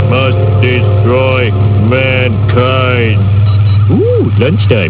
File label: destroy